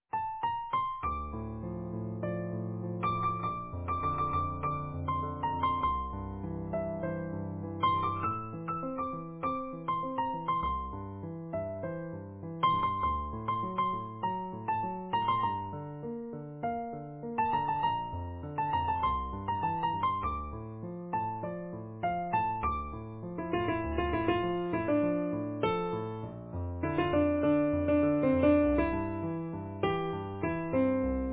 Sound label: Easy Piano